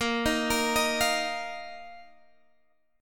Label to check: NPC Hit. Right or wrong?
Wrong